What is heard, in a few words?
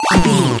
retro damage hurt ouch